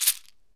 SHEKE, Afro Shakers, Shekeres

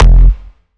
Bass F, Bass Synth

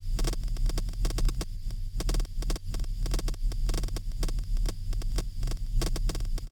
pad; M8

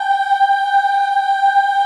G4 child ah, choir; USB Soundscan vol.28; Acoustic & Synth; AKAI; CHILD AHF

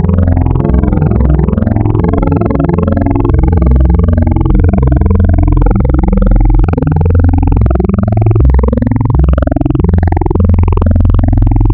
Spectral, wavetables; Architecture 32

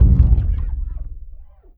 bass fx, drum & bass, 150 BPM; Ueberschall; Drum & Bass Resonance

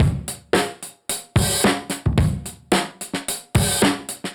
Beat Xtra Dust 110, dusty funk; musicradar; Beats; Alt Sound

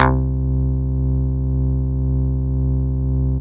SNARL C2, BASSES

